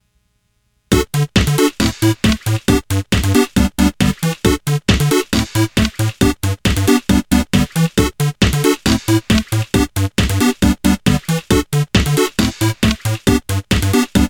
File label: echo Chords